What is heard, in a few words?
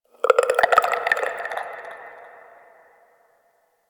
Infested Tendon Chatter; Warframe; Corpus Infested